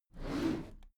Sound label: packed drawer smooth open regular halfway